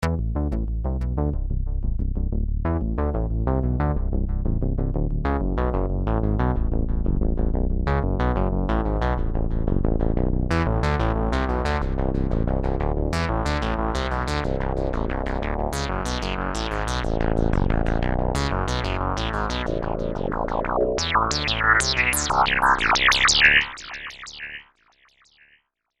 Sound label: Bass Seq